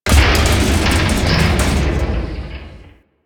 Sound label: Pit Object Rattle; Warframe; Infested